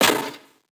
perc smash; one shots; SEISMIC Drum Sample Pack; Slate

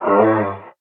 vocal ion Am; SD AURORA Sample Pack; Slate

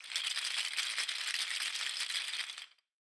rattles Roll, Raw Perc One Shots

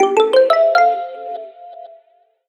tonal one shot F; Pop; Slate; PASADENA Pop Sample Pack; Tonal One Shots

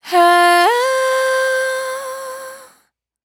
riff Flip Fm, Vocal, Dry Vocals; Raw Vocals; Slate Digital Omnivox Vocal Sample Pack

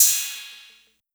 Cymbal Fast Splash, 808; 808 Cymbals; Slate; Vintage Drum Machines Volume 2 808 Edition

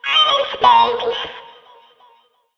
phrase Bbm, Vocal; Slate Digital Omnivox Vocal Sample Pack

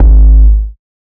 808 Bass Stab Ab3; Slate 808 Deep Dive; SD Signature 808s

